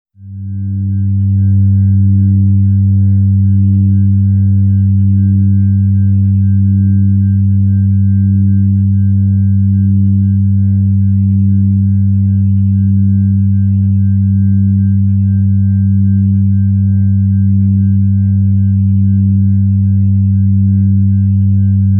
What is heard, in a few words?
organ tone g